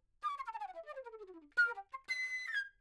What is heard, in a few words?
Flute